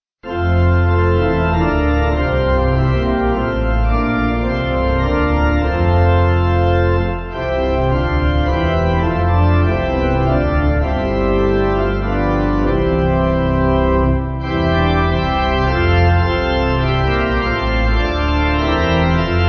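Pipe, Free Music Backing Files for Churches, Pipe Organ; Thank You O God; Quedlinburg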